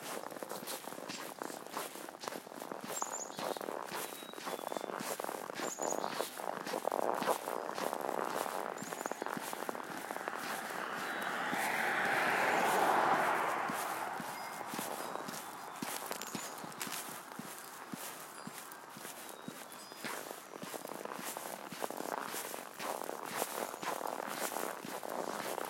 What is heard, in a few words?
Bird